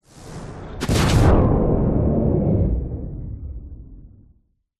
fantasy magic spell dark electricity whoosh into thud and wind howl; zapsplat